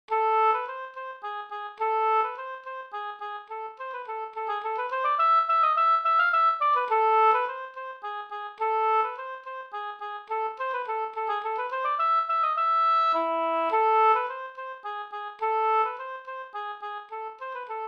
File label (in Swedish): polska fr Lappland